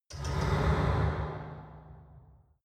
mechanic steam

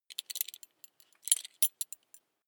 metal belt buckle clatter regular

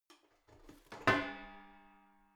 garbage can open lid hit wall

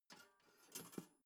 toaster pull down soft

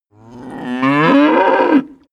cow moo single close

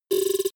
typewriter sequence

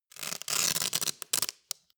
velcro undo roomy long

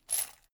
chain mail armor movement

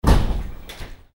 door

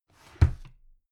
drawer cabinet carton close heavy halfway

